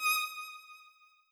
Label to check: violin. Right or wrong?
Right